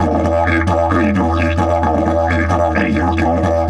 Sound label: E 130, didgeridoo; Big Fish Audio - Didgeridoo and Other Primitive Instruments; 130BPM